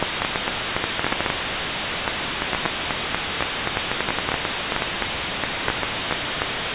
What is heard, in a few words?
shot processed with white noise